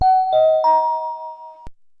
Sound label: Bell, Deurbellen